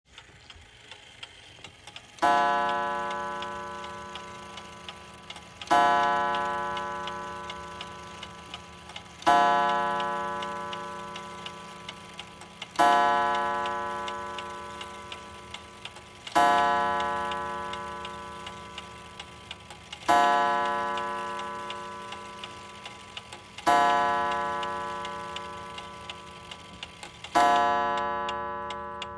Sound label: Clock Sound